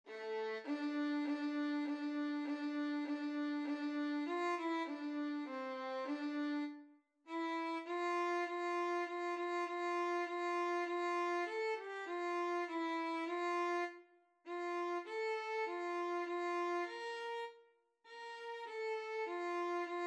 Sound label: Easy Violin in D minor